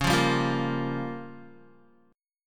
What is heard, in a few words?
Db 6th Flat 5th Db down Guitar; Db6b5 strummed; JGuitar